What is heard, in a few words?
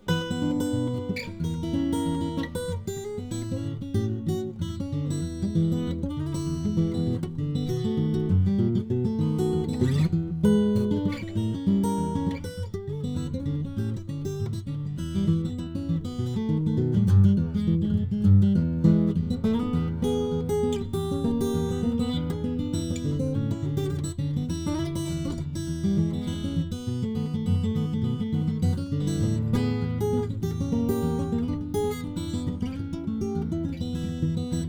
CB Model J Mahogany; Gene Bush